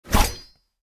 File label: Katana swings in air